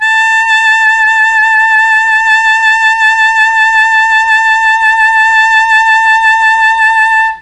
A3 ALTO SAX, mellotron; CHMB ALTOSAX

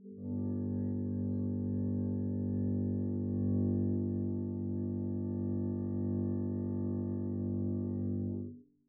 arco g1 pp down, double bass

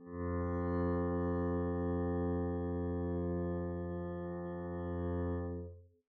arco f2 pp down, double bass

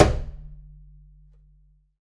perc, pizz; meatbass

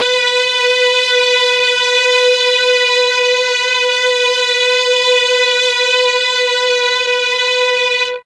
B2 16 VIOLIN, mellotron; TRON 16VLNS

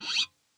scrape, glowly bass

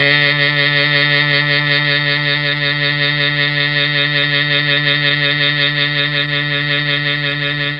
D2 MKII SAX, mellotron

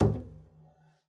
pizz noise mute g, double bass

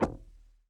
noise muted strum, swag bass

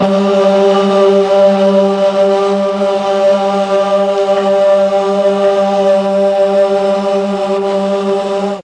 G1 boys choir, mellotron

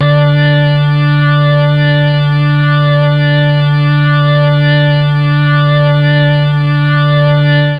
D2 organ, mellotron MKII organ

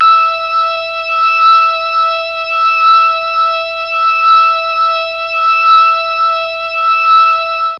E4 organ, mellotron MKII organ

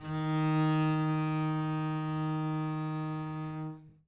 eb3 down, bass arco; meatbass

